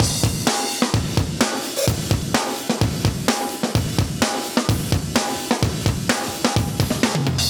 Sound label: 128 live breaks